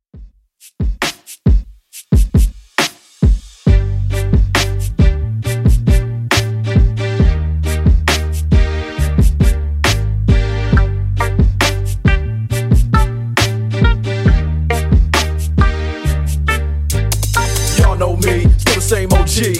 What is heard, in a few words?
Throwback Rnb Redrum 68bpm